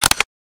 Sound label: cam snap; LG Wine Smart F480K